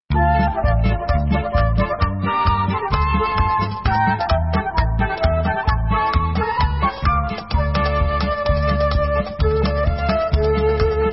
Hora Instrumental

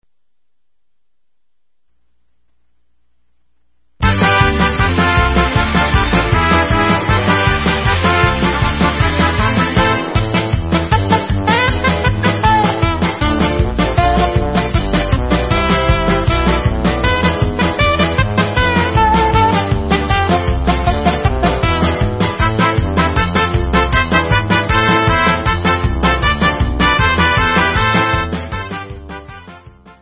Hora Instrumental

